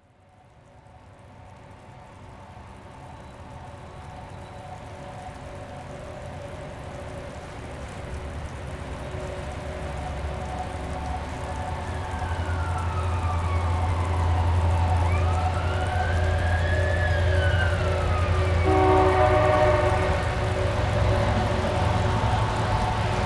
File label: rain whistle